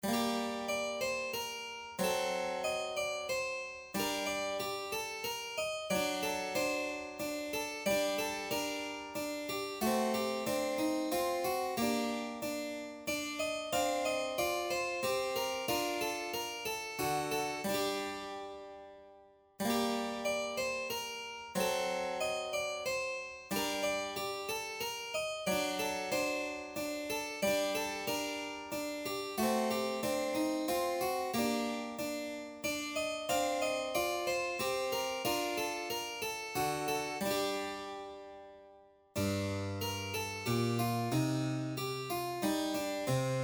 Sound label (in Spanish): Minueto en Sol menor